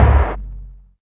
Kick, Alesis DM5

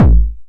909 BD Tape Sat; Goldbaby Tape808 & Tape909; The Tape 909

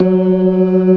ORGAN, C3 NO PERC; Keith Emerson Signature Series

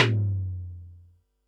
tom, Pearl SC-40 Toms

